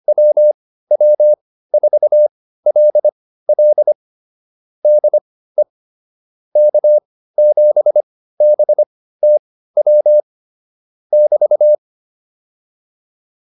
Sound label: QSO, intermediate practice files